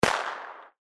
爆竹

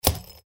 核桃音效

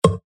蛋糕音效

